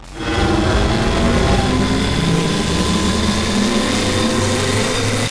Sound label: arc cannon chargeup mid; Titanfall 2